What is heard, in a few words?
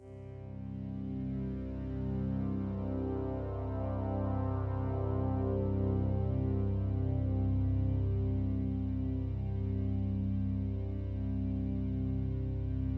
C Drone Improv; Improvisation for Classical Musicians